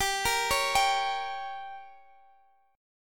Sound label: G Diminished G, Guitar Open D; x,22,20,19,22,x; down